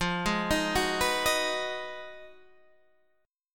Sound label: B 6th F, guitar chord; B6/F; arpeggiated